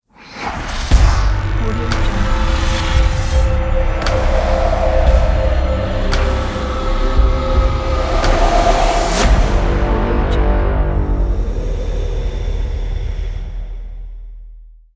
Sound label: cinematic dark logo